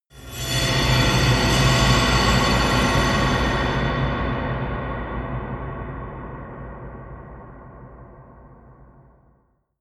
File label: eerie industrial swell